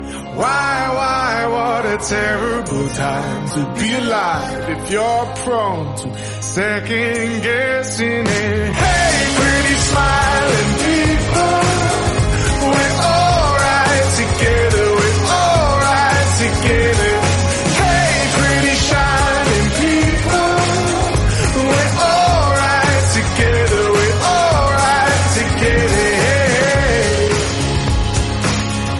romantic english song ringtone

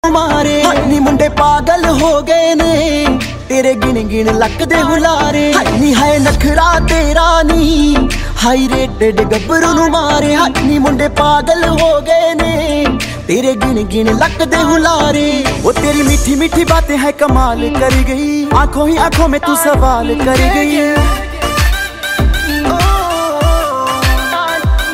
new ringtones bollywood hit ringtone

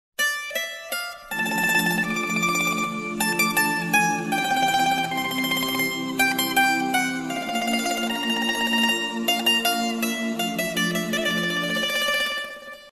lovely hindi background music tone

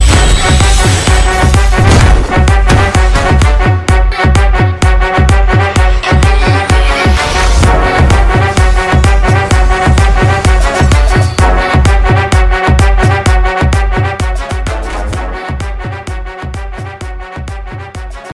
thrilling movie background music tone ringtone

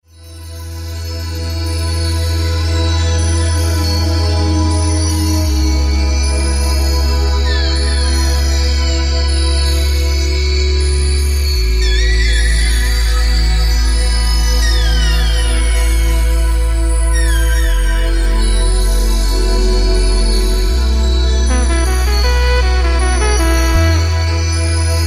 electronica ringtone

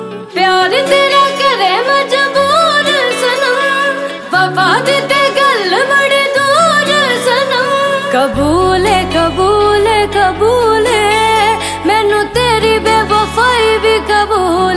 ringtone, bollywood ringtones

